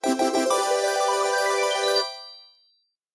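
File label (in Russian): пройденный уровень в компьютерной игре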